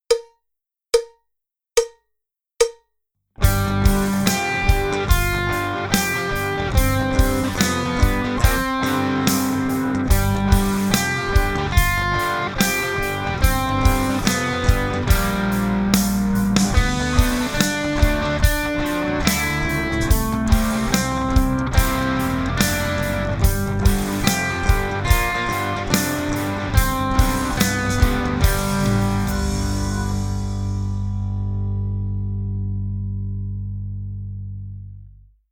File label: Three String Boogie